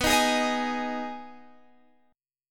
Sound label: Eb Suspended 4th Sharp 5th B